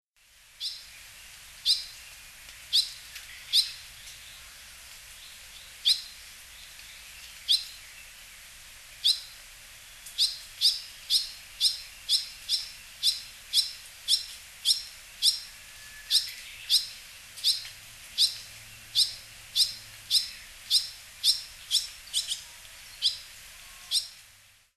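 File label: barn swallow, hyogo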